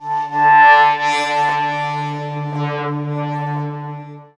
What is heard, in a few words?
SYN, Roland, digital